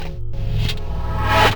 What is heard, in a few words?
Granular